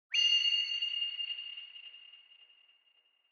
Onoma Flash Low Long

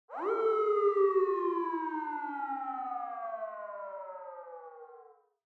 Police Car Siren Far

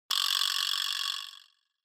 Vibraslap Short

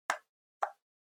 Handheld Transceiver Button

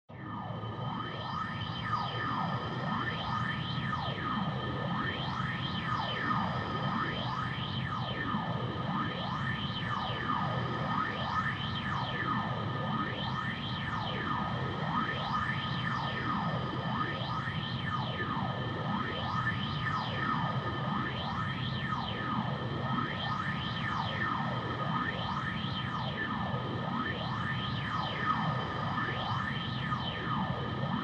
Retro Anime Jet High Far

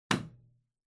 Light Switch-Box-Rocker (Wall-Hard)

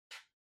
Match (Far-Close)

